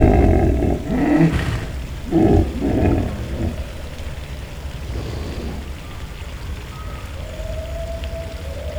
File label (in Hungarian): horkantas fele, nilusi vizilo; debreceni zoo 2012